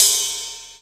Long Tail Reverb Ride Cymbal One Shot A Key, Royality free ride single hit tuned; cymbal drum samples